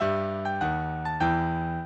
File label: minuet, mozart